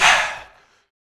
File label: Breath; Stashes; BBoy Maniac drumkit